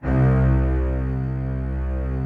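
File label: string, orchestral strings; Greytsounds VOL-1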